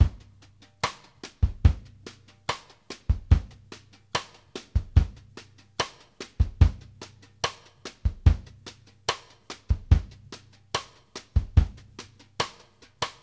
BRUSH + CLIC, BALLAD 72; Sampleheads; New York City Drumworks VOL-1; SP BALLAD 72

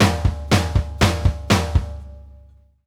TOM+KICK; Sampleheads - New York City Drumworks VOL-1

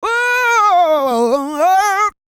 SING, Vocal Planet R&B & Dance; ILIO

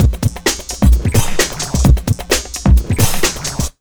JUMP 130, Big Beat Drumloops

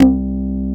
Korg CX3, C 1

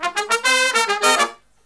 Brass Riffs; USB Soundscan vol.29; AKAI

